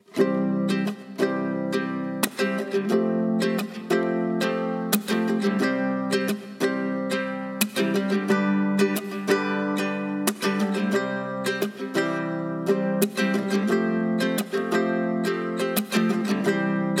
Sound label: ringtone guitar; Mudita Pure